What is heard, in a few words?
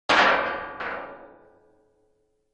metal; WMBonusXP